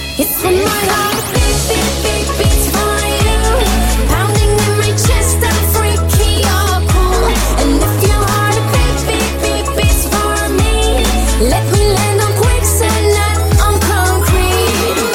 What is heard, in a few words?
Heartbeat